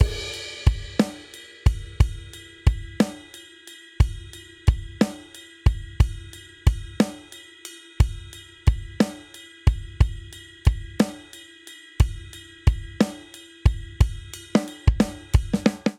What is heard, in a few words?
Swing Six RnB Chorus 6/8 90 bpm, Drum; Ableton; Factory Packs; Drum Booth